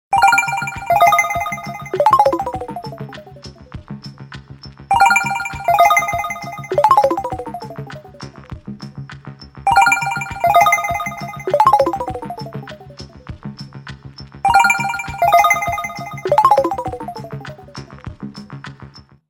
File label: Sonar Echo Bell Drum, zil sesi